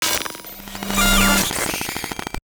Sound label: Electro Magnetic One Shots; Obscure Frequencies